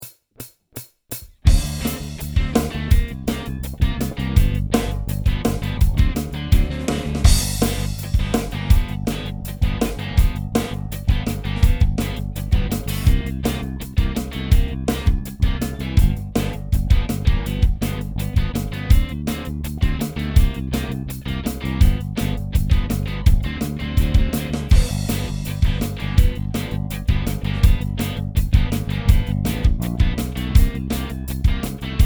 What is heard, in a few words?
solo backing